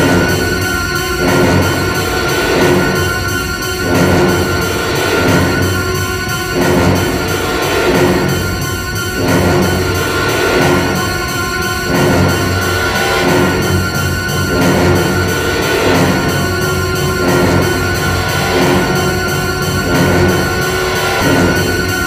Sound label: chase; slender; shape